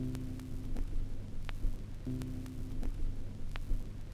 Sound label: Crackle Loop, Vinyl Noise